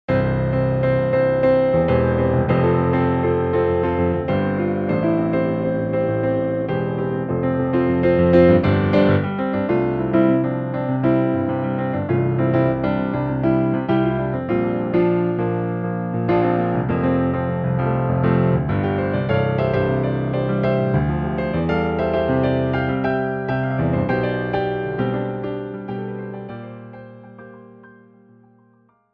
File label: Yamaha P525 CFX Grand